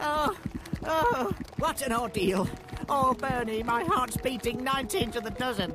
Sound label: heartbeat